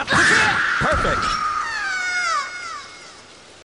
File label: Death scream; Tira